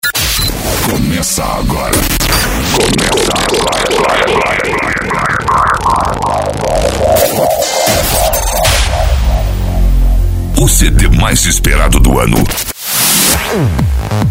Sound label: dance; sport sound volume 01